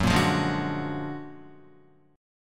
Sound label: Gb Augmented Major 9th Guitar; Gb+M9 strummed; JGuitar